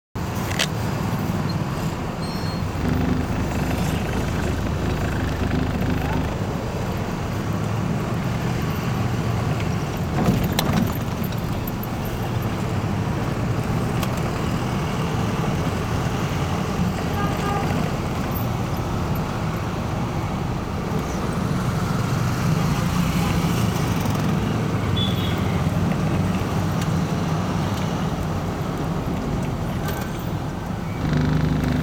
Fahrt nach Pharping; Boudha, Kathmandu, Nepal

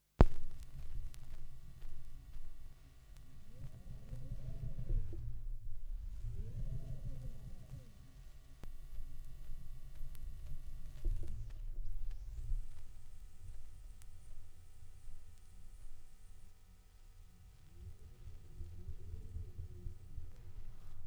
schallplattengeraeusche, Vinyl; wendelrekords 02

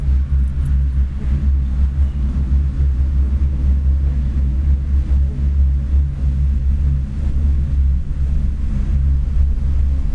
verkehr u bahn, kuehlgeraete; 2006 - 2007 kuehlgeraet

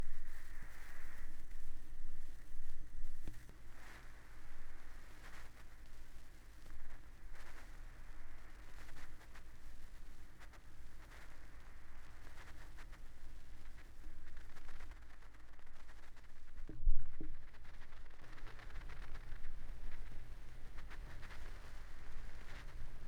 schallplattengeraeusche

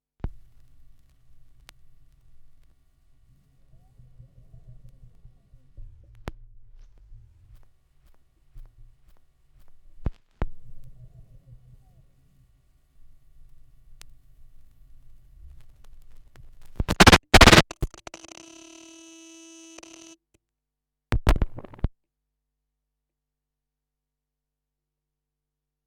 schallplattengeraeusche, Vinyl

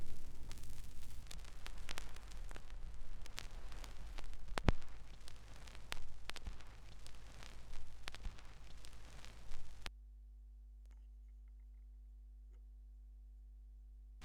schallplattengeraeusche

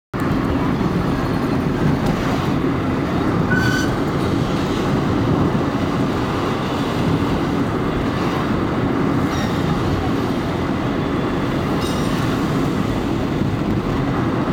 Fahrt nach Shivapuri Nagarjun National Park; Boudha, Kathmandu, Nepal

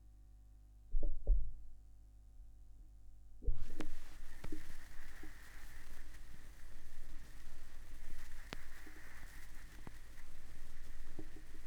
schallplattengeraeusche, Tape Echo Mix

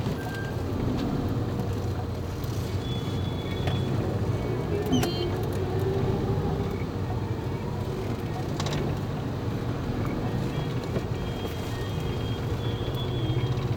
Fahrt zurueck, Boudha, Nepal; Kathmandu

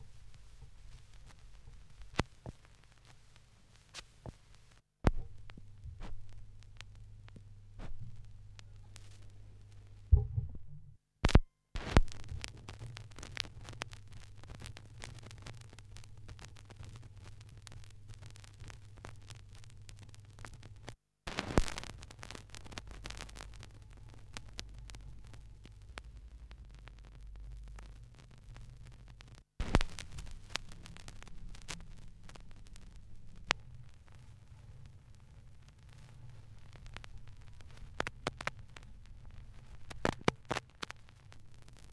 schallplattengeraeusche

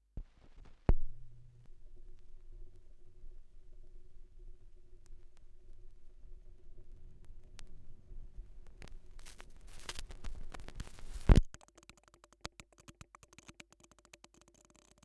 schallplattengeraeusche

